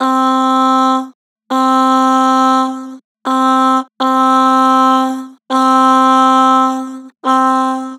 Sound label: Aah, Vocals, Flat; Harmor Vocals